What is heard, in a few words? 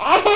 Baby Laugh